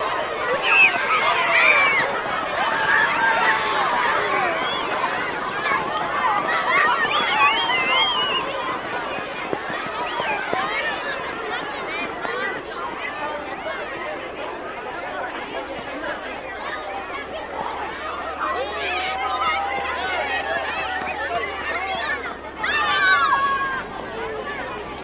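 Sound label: Children Group Yell Scream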